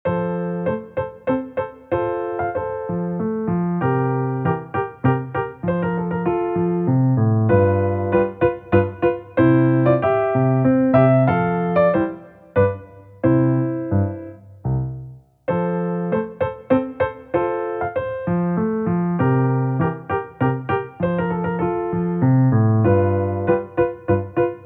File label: Minuet, piano; Don Giovanni; K. 527; Mozart